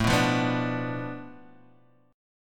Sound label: B Minor 7th Flat 5th A